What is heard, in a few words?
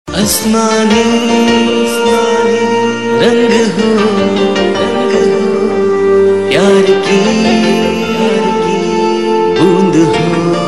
Male; TV Serial Tone; Star One; Dill Mill Gayye